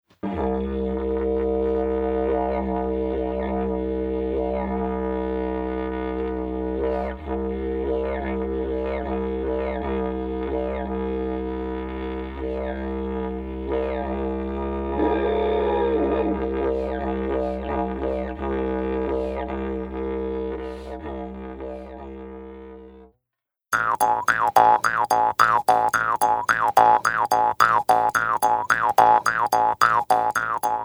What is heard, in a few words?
Changes in timbre